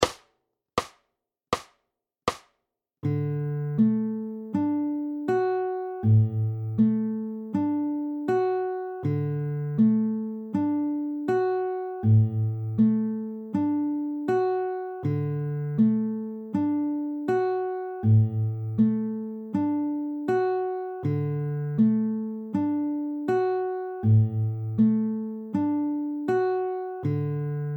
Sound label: Travis slow, fingerpicking